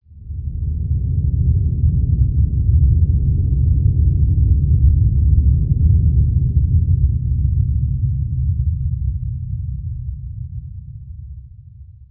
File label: rumble, caves; hl2 ep2; ambient, levels